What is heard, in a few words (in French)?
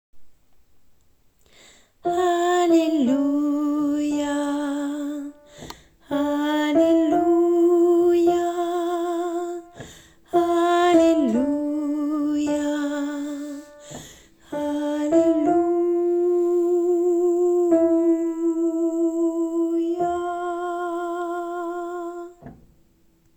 hallelujah refrain soprano et tenor